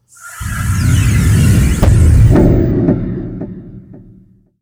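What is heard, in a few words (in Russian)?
задержка взрыва и свист через звук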